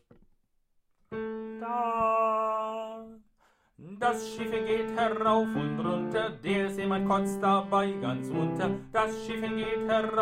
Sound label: Drunken Sailor Tenor; gesangverein wollbach